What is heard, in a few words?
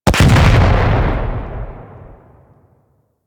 RPG Explosion; Hellion Elite